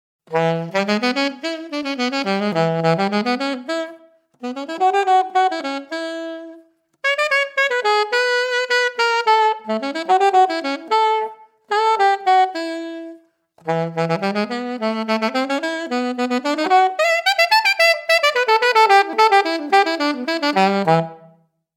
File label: Yamaha YAS62 solo, Es-Alt-Saxophon